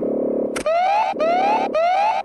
wet electronic alarm

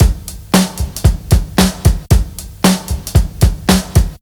114 bpm drum loop sample g key

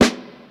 rich low end snare one shot a sharp key

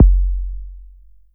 big bass drum single shot f sharp key

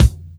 sharp kick drum c key

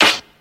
sparkle snare f key; snare drum samples

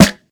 tight snare single hit g key; snare drum samples

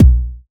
bass drum one shot b key; tuned drums

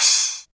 tamed open hi hat g sharp key; open hat samples

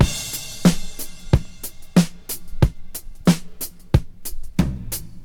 91 bpm drum groove A key; drum loop

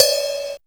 crash one shot c sharp key

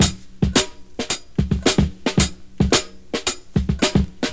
110 bpm drum groove c key

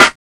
sparkly snare a sharp key; Duration 0:01; Mono

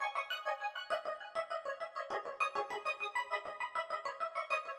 Arp Texture Melodic 100 BPM; synth; Samplerate 88200 Hz; Stereo; instruments; loops

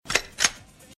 gun cocking

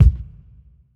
tight kick one shot B key

